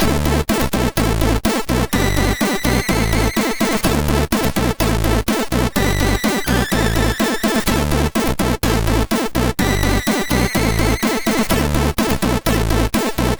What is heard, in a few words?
Power sid MOS6581R3